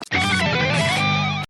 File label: radical guitar lick